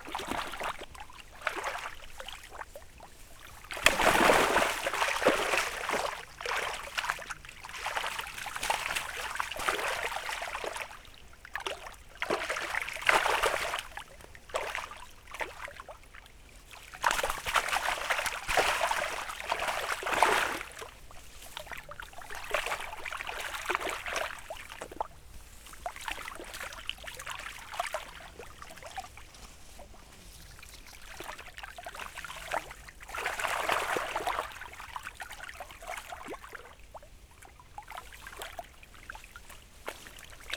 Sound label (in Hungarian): szákban recsk, ponty